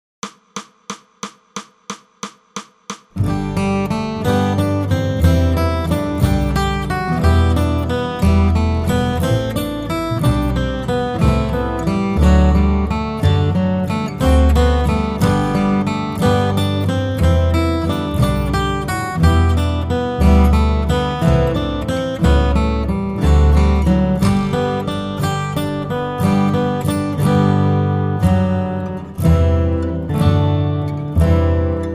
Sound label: Jesu Joy of Mans Desire Guitar 180